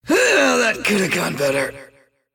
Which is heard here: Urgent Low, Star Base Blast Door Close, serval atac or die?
die